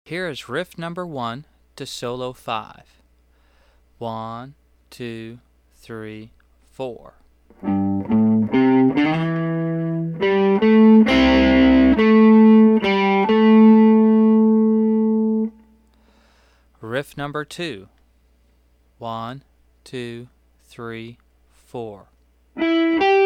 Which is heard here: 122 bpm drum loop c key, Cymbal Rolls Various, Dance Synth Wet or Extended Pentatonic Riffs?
Extended Pentatonic Riffs